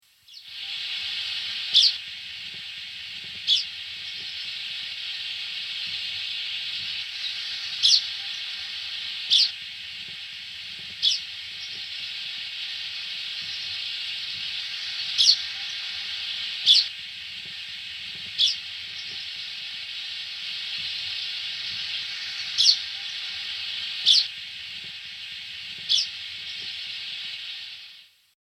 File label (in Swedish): mongolpiplarka